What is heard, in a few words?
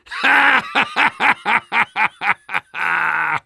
Soldier laugh long